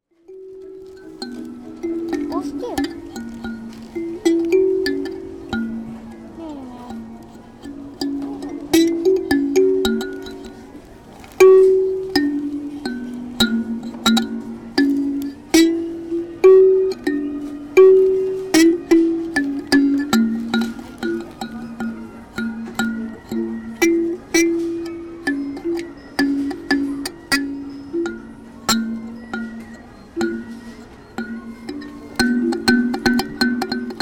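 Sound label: Indonesia no gakki fea oyayubi piano, Indonesian instrument thumb piano; Chino Cultural Complex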